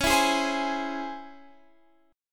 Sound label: Db Minor Major 7th Db